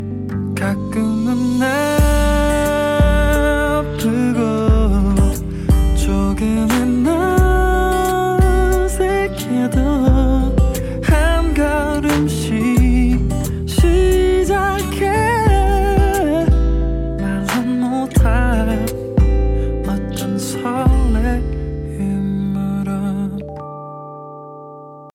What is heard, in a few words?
Flut